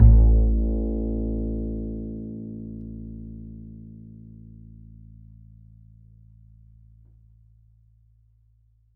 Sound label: E, Upright Acoustic Bass; Larry Seyer; SWITCHABLE POSITIONS